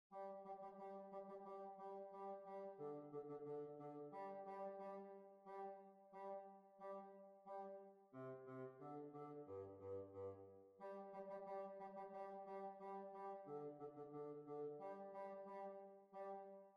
Hornpipe; Steam Packet Hornpipe

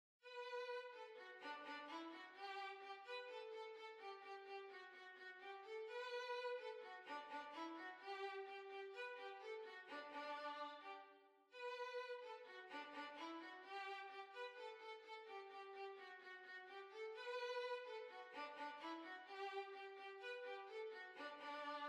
Violin

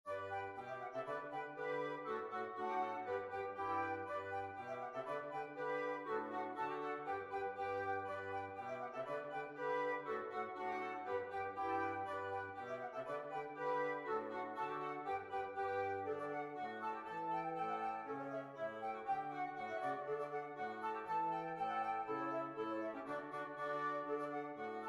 Lees Polka, big band

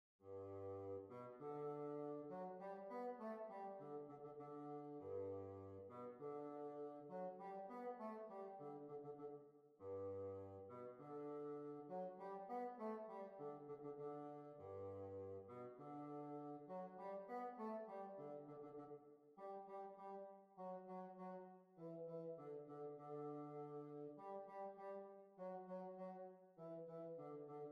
French Quadrille

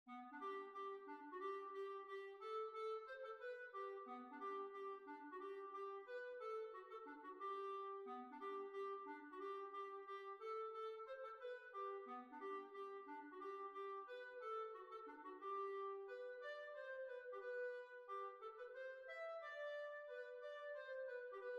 Waltz

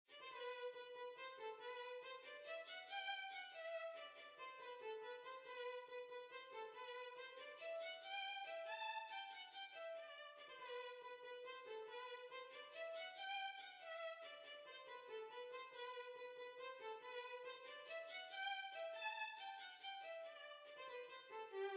Dance, Violin

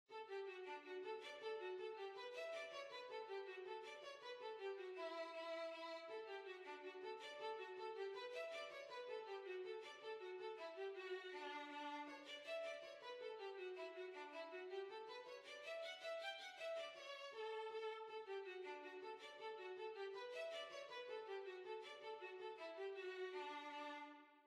Bang Up Hornpipe Violin

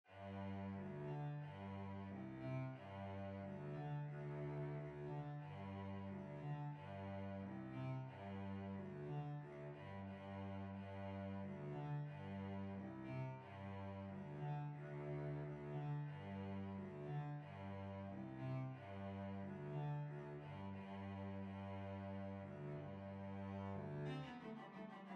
Violoncello

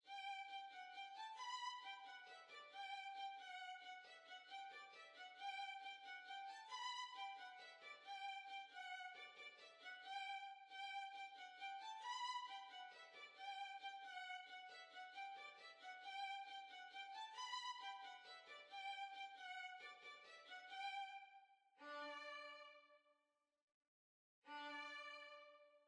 Violin

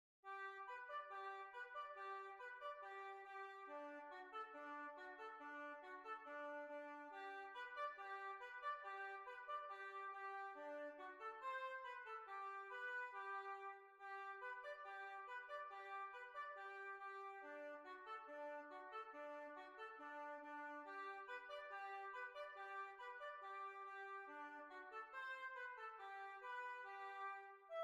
Hillebolla Schottis

